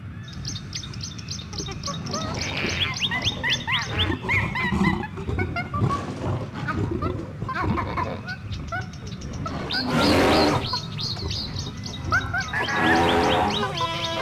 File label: city zoo, Ambient; Project Gotham Racing